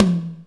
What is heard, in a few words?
Toms, Real